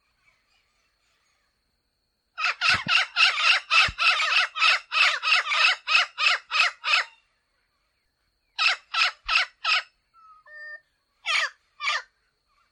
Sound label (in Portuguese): Canto do Papagaio de Peito Roxo